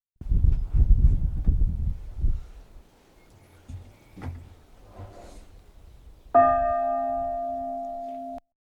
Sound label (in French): cloche; Ecouis